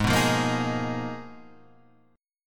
G sharp Minor 6th Add 9th G sharp Guitar Standard, chord; G#m6add9 strummed; 4,2,3,3,4,4; JGuitar